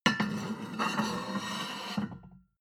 plate on plate place slide soft, restaurant cafe; sport-freizeit

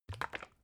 bottle syrup upend

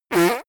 nose blow

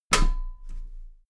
step metal ladder step top heavy

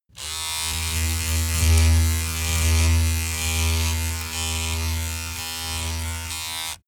razor on load pov short boomy; maschinen; rasierer

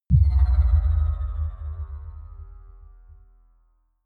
sci fi bell bass

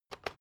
electric whisk turn on soft; haus-garten; kueche

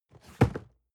cardboard box medium fall low; objekte

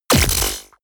sci-fi shock blast

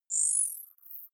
zoom out light, signale

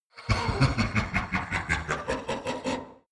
demon laughing gloating; kreaturen

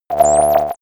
cracking synth, impacts; hits explosionen

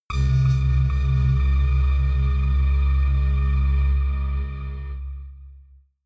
synth hum echo, drones

